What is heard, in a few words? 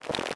walking; sound effects for the hibernator